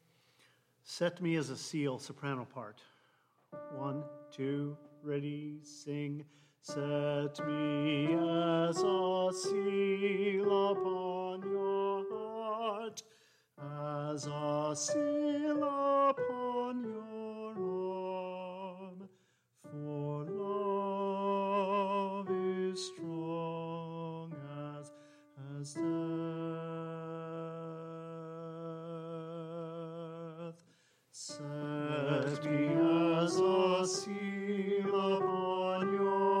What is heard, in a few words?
All Soprano